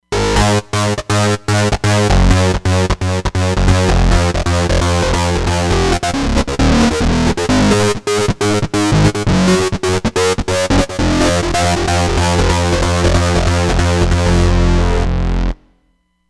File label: Destroyed Bs; Roland Fantom XR